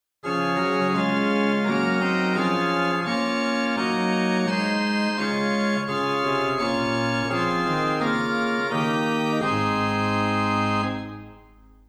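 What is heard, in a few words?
Gedackt 8 + Rohrflöte 4 + Nassat 3 + Octava 2 + Terz, organ; Reinhardtsgrimma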